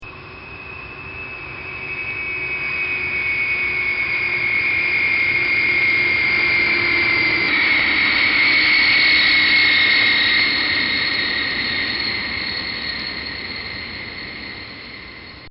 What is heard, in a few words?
Hawkeye Gruman E-2C Landing